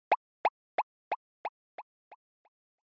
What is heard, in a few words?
Short Accent Delay